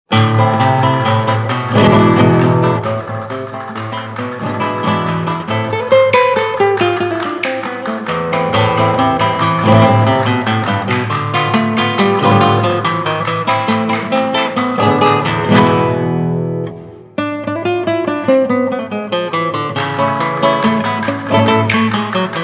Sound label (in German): stierkampfmusik